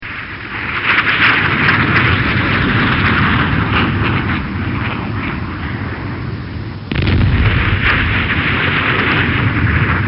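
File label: Maverick Missile Approach And Impact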